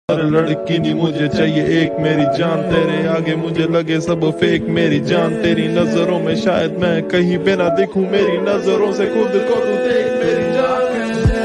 lofi ringtone chill

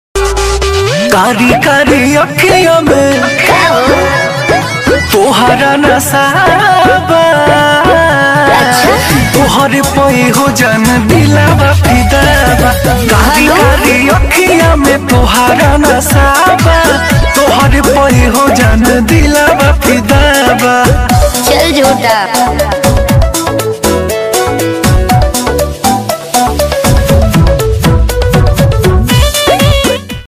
bhojpuri ringtone

